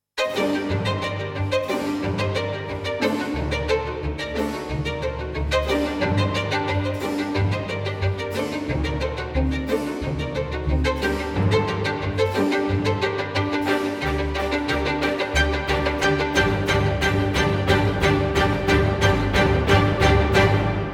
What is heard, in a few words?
Virtuoso Baroque Violin Concerto Opening BASSES ONLY